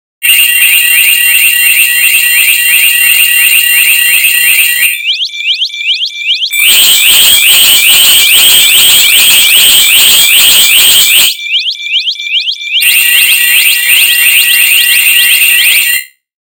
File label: alarm buzz